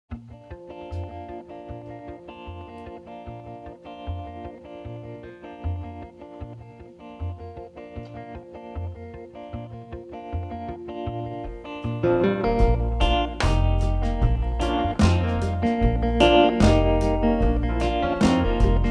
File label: Blues